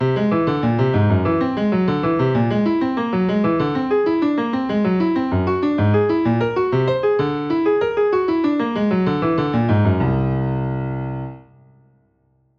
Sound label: Jazz Symmetrical Scales melodies; How To Improvise With Symmetrical Scales